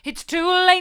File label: it's too late hard, vocal